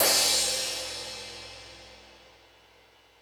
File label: Crash 2, drum machine